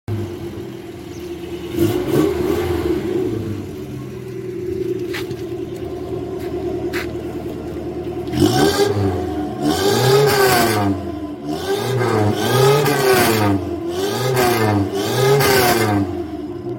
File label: Nissan Exhaust Sound